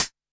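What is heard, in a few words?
Electro Kit Closed Hat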